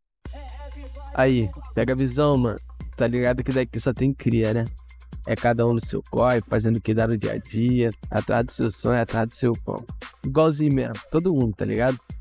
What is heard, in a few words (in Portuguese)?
musica